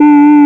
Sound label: HOLLOW MID, synths & keys; E-MU Formula 4000 Series Vol. 2